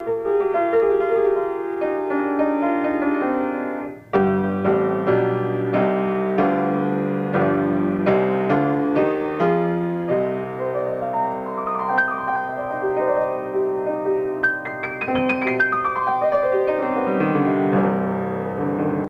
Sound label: Piano